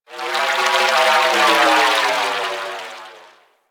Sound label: Ocean Cloning Machine Gurgle; Ambience; Props